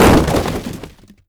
break cardboard box; physics; breaking

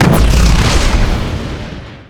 mortar explode; weapons; Half Life Alyx

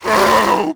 grunt, zombie; creatures

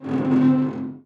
cable stress; ambient; dust2

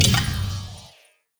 step run, footsteps, player, lightbridge

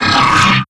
vox push, strider; creatures; combat; seq headbutt

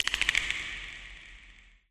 creature antlion click; creatures

